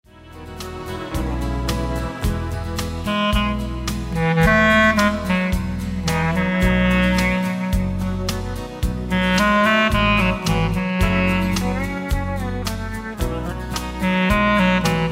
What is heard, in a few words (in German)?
Klarinette